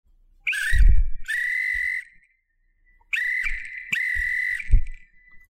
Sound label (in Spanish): silbato fuego; silbato de emergencias